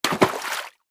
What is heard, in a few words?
Object Enter Water; Red Ball 4 Vol 1